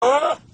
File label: HUH Goat